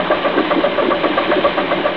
CHUG; TinyTown

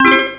pling; bounce

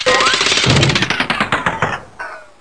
Mach no Work; Bible Fun Pack; Education Publishing Concepts; NOAH

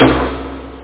clonk

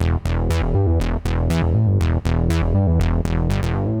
fuzz; Software Explosion 3 (Fore-Matt Home Computing)

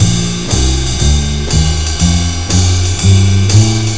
Complete Beats, On the Jazz Tip; Sonic Foundry

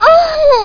Aahhuuh, death; Revenge; deathfx

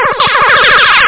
Alien orgasm

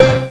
Pick Up Special; Marathon revisited

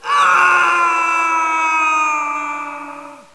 fall; dactylsp

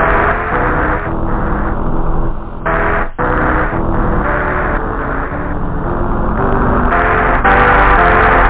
REAL LOOP, LOOPZ; PhatTrax

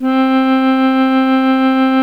Harmonica; GMTones

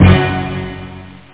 bass hit; Aminet 21; GTI - Schatztruhe

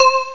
vib bell; Epic Collection 3, The; Epic Marketing; muzak

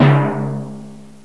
Timpani C#; GMPlay; Drums; TG300B

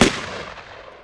tommy gun; 3D Game Programming All in One

